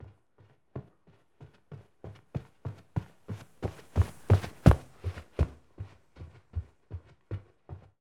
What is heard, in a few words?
Running